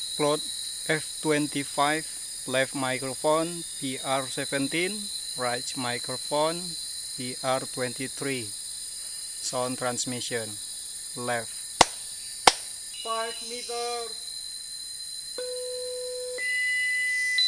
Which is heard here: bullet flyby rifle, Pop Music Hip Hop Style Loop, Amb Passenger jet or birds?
birds